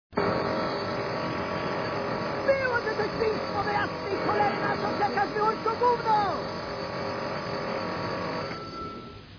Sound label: alarm